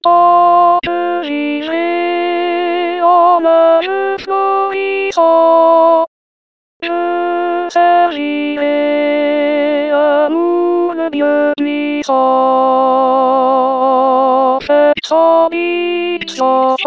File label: alto; tant que vivray